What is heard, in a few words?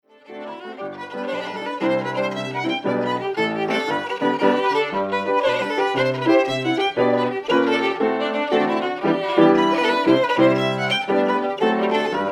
Jig; Paul Cranfords Jig